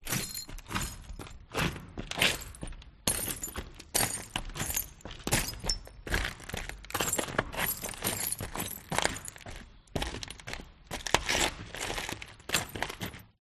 foley footsteps broken glass large abandoned house natural reverb